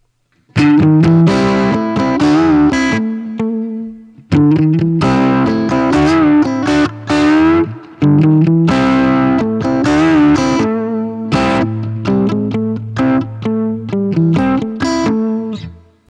crunch

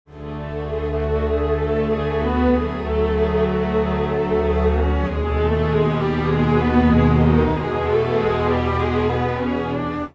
strings, timestretch

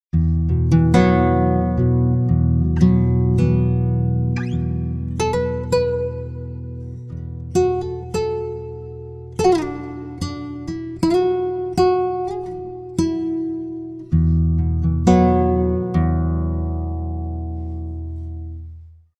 pitchshift guitar

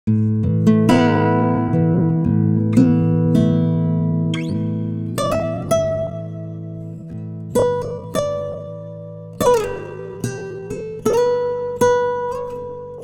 guitar, timestretch; ikm sampletank stretch

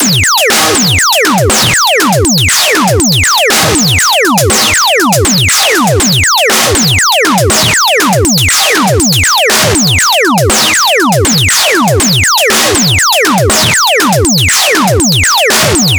self res lfo; universal audio

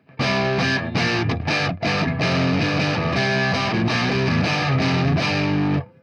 jazzmast; fender classic player

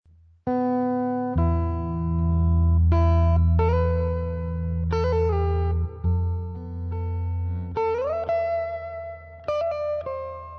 phrygian

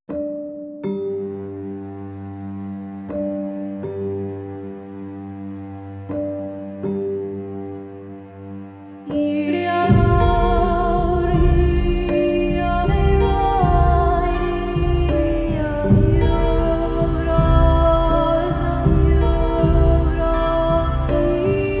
bulgarian mood; eastwest

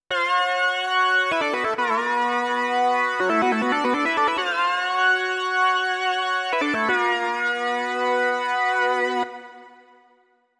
lead fifth, Clavia Nord Wave; portamento; synth sounds

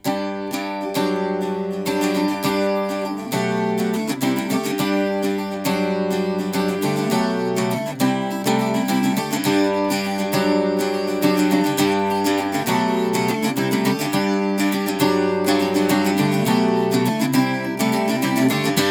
bouzouki; droit; akg c414; audient mico

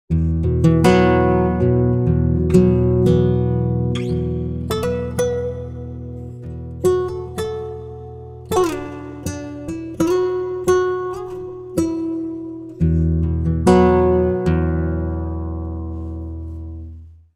pitchshift guitar, Prosoniq TimeFactory DIRAC -5

